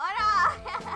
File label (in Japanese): オラー！笑い